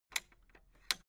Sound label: fence gate latch rattle open; objekte; tueren